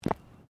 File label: FOOTSTEPS FOOTBALL BOOTS CONCRETE STEP; Footstep Foley; Human